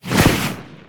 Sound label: Vortex Blast Impact; Evolve; Kraken